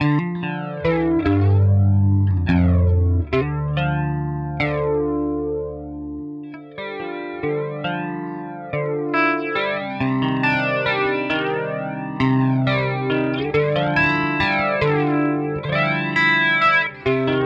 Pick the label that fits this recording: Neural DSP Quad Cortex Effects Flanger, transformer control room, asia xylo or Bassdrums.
Neural DSP Quad Cortex Effects Flanger